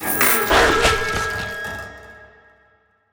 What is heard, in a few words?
small Win; netent